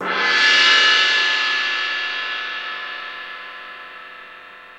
CYM, FX Cymbals China

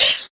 RSS, 160 BPM

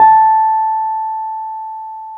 PNO, Wurly

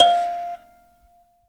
BUZZ, Buzz Kalimba; Roland LCDP11 Africa VOL-1; Buzz & HiBz

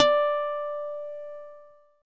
SYN HARMOB, Roland; Sound & Vision - Gigapack I CD 2 (Roland)

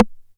909 Rim Tape; Perc; Goldbaby Tape808 & Tape909; The Tape 909

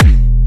Jump Kicks, Bassdrums

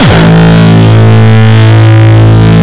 Longkick, kicks

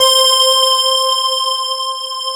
DANCE BEL C5; Q Up Arts; Dream Experience

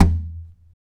TAP, FLAMENCO Guitars; Spectrasonics; Hans Zimmer Guitars VOL-2

